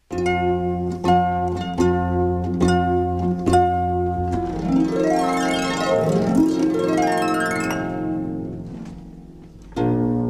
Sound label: Bridal Chorus; Wagner